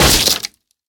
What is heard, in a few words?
BulletImpact Wood, Surface Effects; HQ FPS Weapons